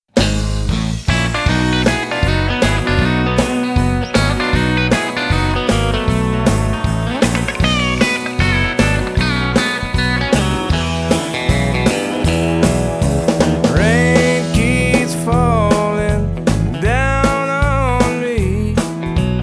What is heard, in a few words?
sad and blue